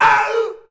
"auh", shouts; Best Service; Hyper Dance

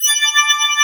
DG WAVE, DIGI SYN

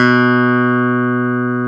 CLAV B2; AKAI S-Series CD-ROM Sound Library VOL-8

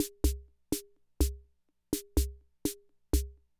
Loop, classic machines; arranger

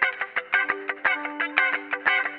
GUITAR

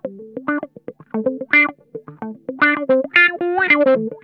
WORMY, Funk Guitar; Zero G - Funk Guitar

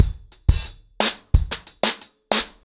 ACOUSTIC DRUMS, 90 BPM

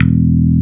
PUMP BASS E2; Drums In Your FACE!

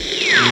STATIC; East West - Phat & Phunky

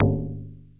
PLAY-HI, FM PERCS; Crypton - DrumBase5000

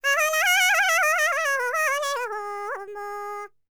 BALKAN, World Voices; ILIO; Vocal Planet

